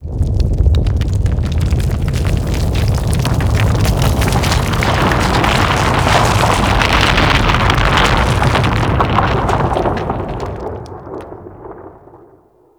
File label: Excavation Spawn Rumble; Skate; Warframe; Ambience; Grineer Settlement; Grn Cmp Excavation Objective